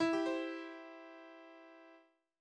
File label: gong; znelky